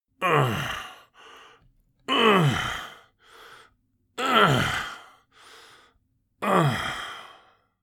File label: Senior male breathing moans